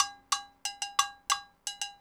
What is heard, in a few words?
timb cow 120 bpm, latin; Sound Effect Library